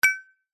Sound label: multimedia notification bright metal tuned mallet ping hit; zapsplat